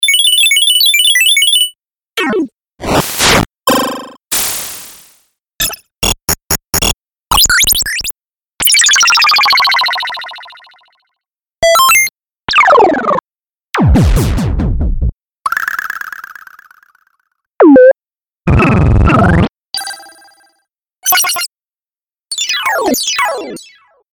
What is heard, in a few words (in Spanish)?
Samples 8 Bit; Muestra Samples